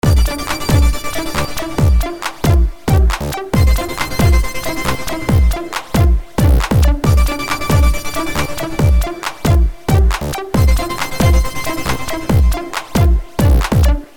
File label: c64 vs electro